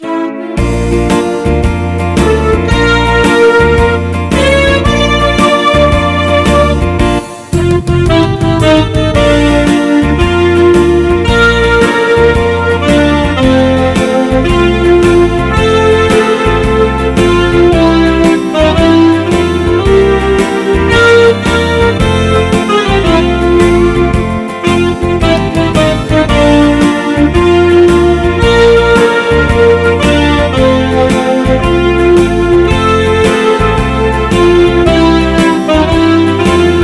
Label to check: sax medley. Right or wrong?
Right